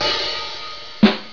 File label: hihat snare